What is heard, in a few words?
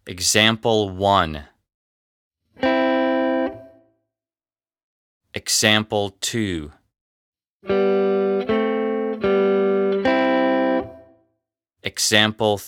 Harmony